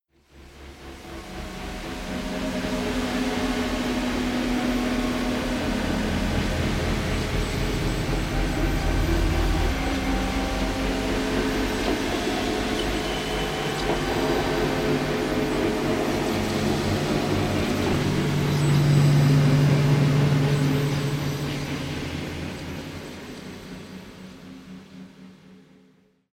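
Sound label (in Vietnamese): Âm thanh u ám đáng sợ khi nguy hiểm ập đến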